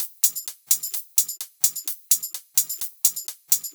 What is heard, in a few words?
Drumloops 128BPM